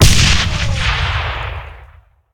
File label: explosion; gusanos promode